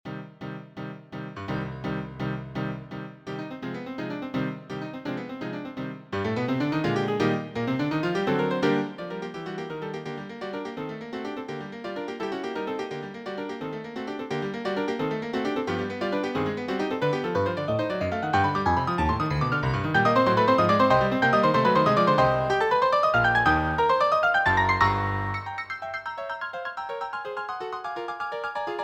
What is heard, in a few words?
Saltarello in C minor